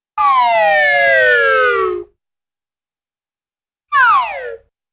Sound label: Descending Whistle, Cartoon; Hollywood Edge; Cartoon Trax